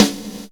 Gated Kit, Percussion

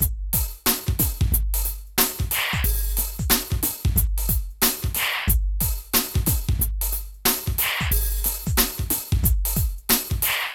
PHATT BEA

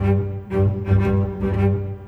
Bass Cello

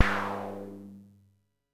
FX; MPC DSM-2

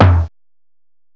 TOM; M8; CMI Factory

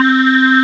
PPG Wave C3; Proteus 2000; Digital Sound Factory Proteus 2000

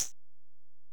EPerc, Alesis DM-PRO

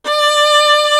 SOLO VIOLA; East West; Global Sounds

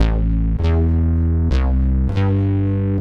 Bass, Futurist Drum & Bass

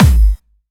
Distortion Kicks, Bassdrums

